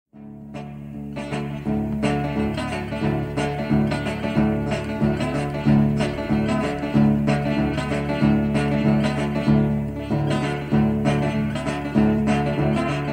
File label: Live, slide guitar; 1968 Newport Folk Festival